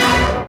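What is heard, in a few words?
synth dance; Best Service Gigapack 1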